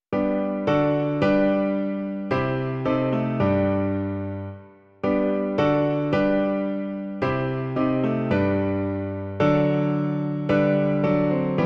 klavír; Otče náš, kéž dnes dáš; Klavírní verze

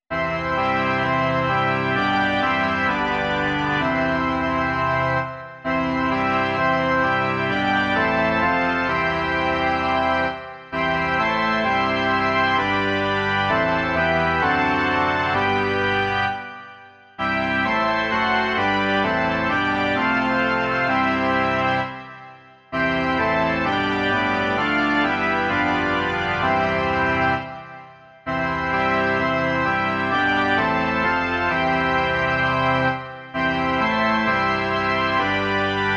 varhany

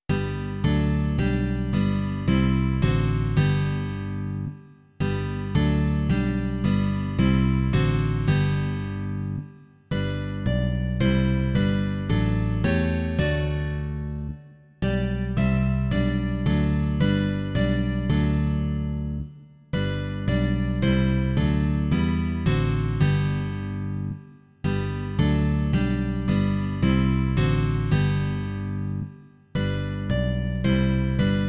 kytara a baskytara; Otče náš všemohoucí; Imaginativní verze

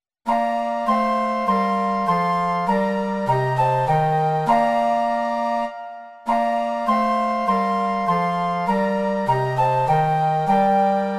trio fléten a klarinet; Hospodine, pomiluj nás; Imaginativní verze

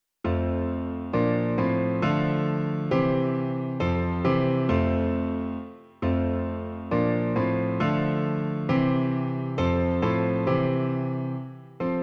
Ó město Boží, ty jsi štít - klavír; Klavírní verze

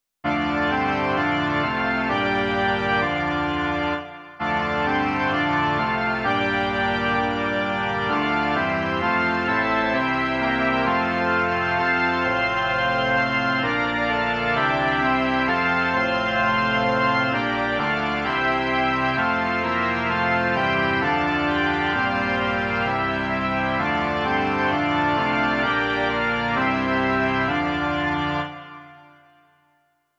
varhany; Ó náš milý Bože

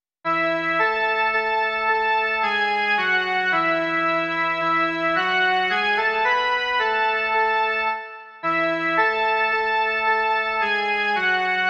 jen melodie; Veselme se, věřící

